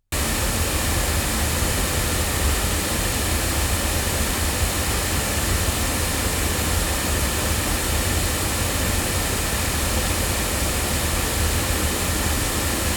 Sontronics Orpheus cardioid loudspeakers

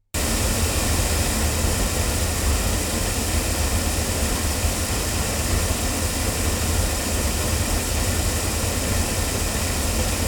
omni loudspeakers; studio projects

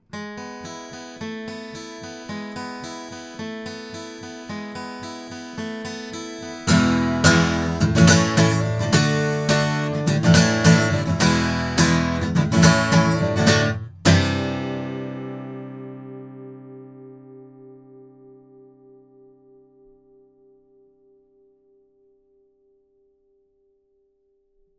JZ BlackHole SE guitar, microphones

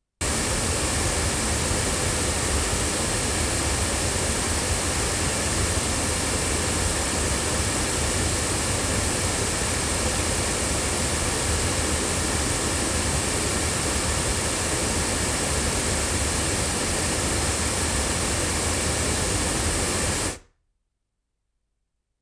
sE 2A cardioid loudspeakers, microphones; se electronics

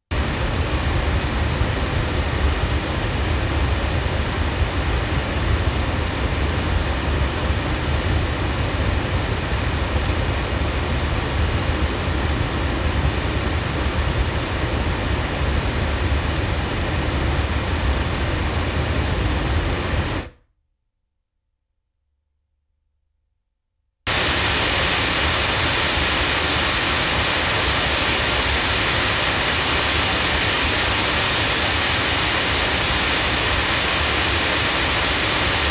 Sontronics Sigma loudspeakers

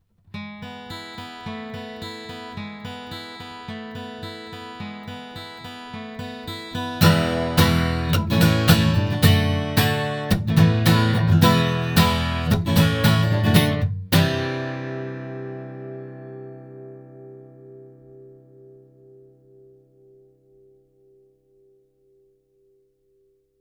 Oktava ML52 guitar

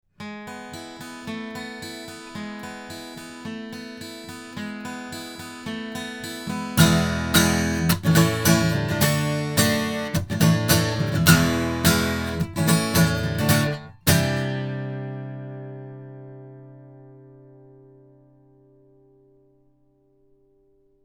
Avant CV-12 tube cardioid guitar

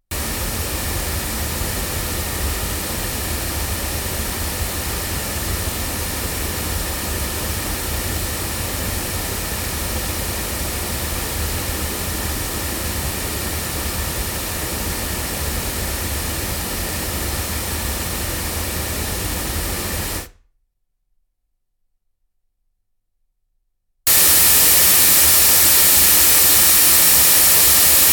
loudspeakers

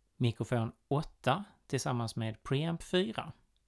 mic pre

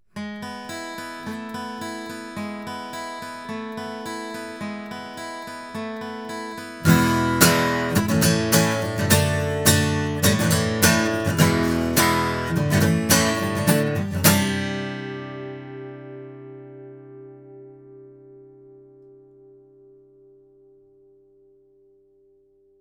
AudioTechnica AT4033A guitar

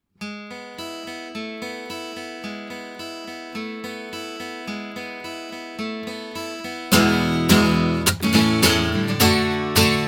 ND6000 guitar Gibson; JJLabs

